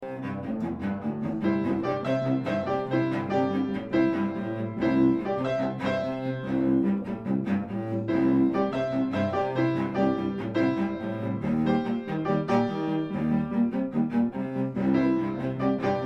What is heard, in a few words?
Piano Cello Excerpt; film score